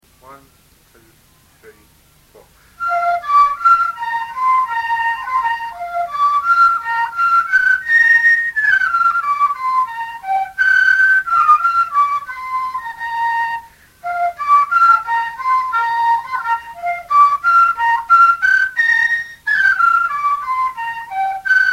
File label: fifer